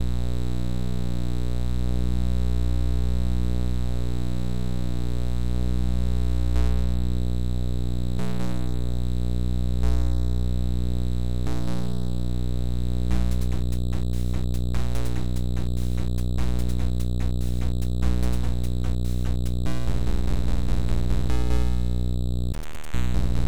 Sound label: TRANCE